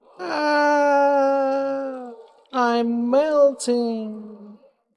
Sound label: melting